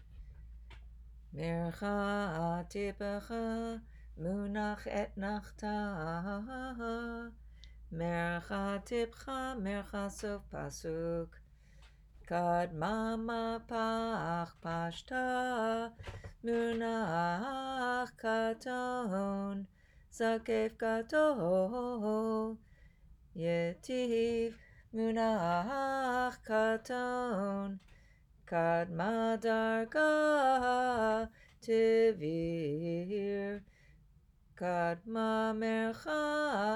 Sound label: Haftarah Trope Cantor